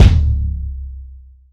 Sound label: ROCK CKIK, ROCK KICK